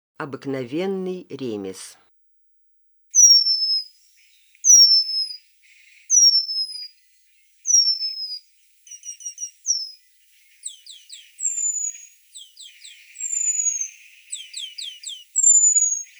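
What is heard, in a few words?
obyknovennyy remez golos, penduline tit voice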